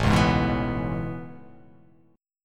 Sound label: A Major 9th A